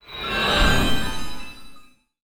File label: war power up